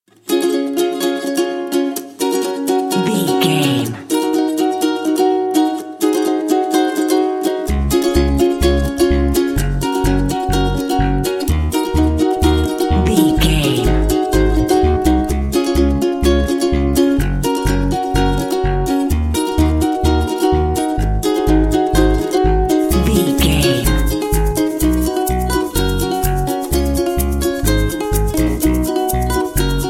tropical fun

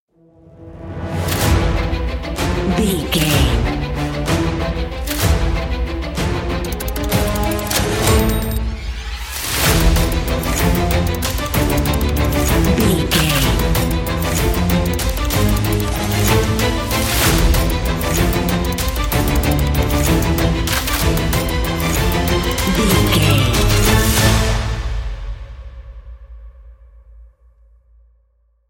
bulletproof action intro ident

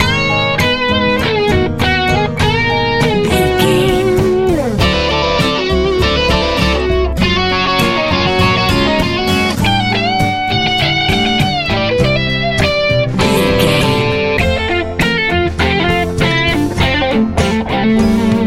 nice powerful easy pop rock

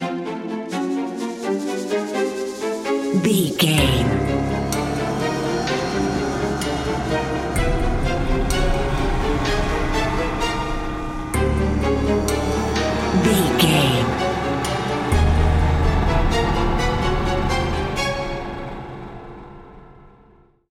sinister horror tension building bumper

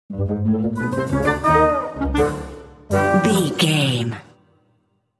cartoon

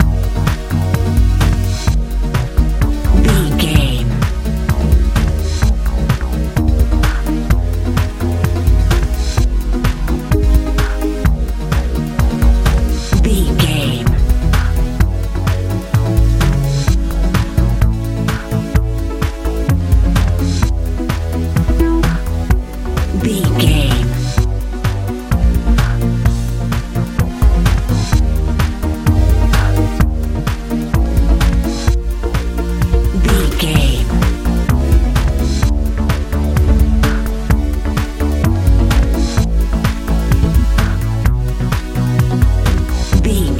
modern dance

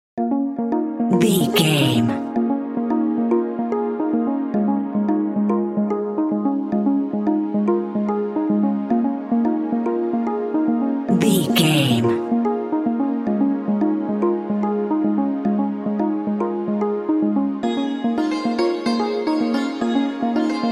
ambient chilled

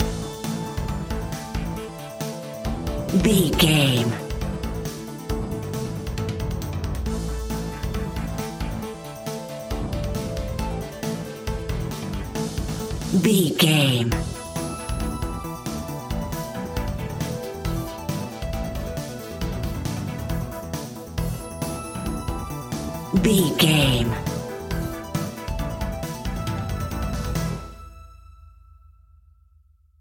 80s synth rock anthem

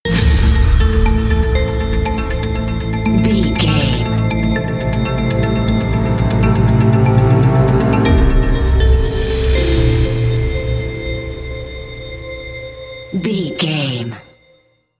evidence crime bumper

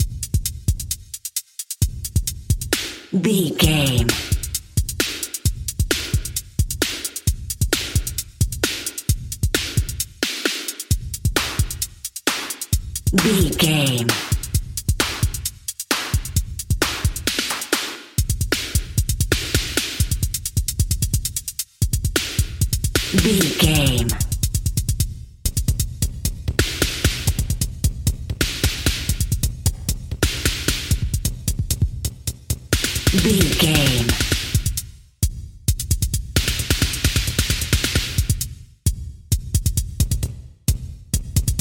intense video game level drums only

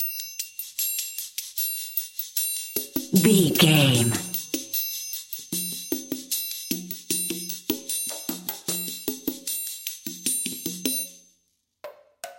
top secret mission percussion only

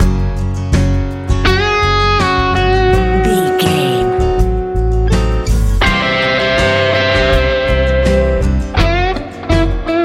easy rock

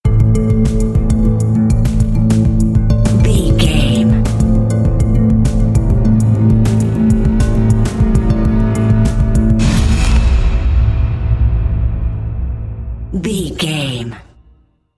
approaching storm bumper

